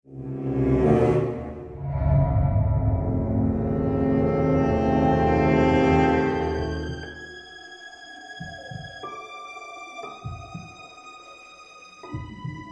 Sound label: Suspense - Haunted House